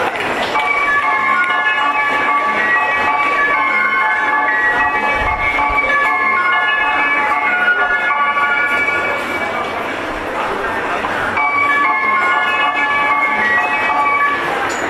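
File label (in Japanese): E231系 東海道線直通快速電車, 走行音; 新宿-渋谷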